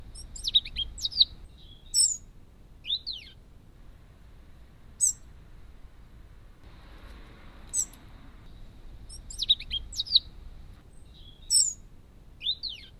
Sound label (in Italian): verso subsong passera scopaiola prunella modularis Dunnock acentor Heckenbraunelle; bioacustica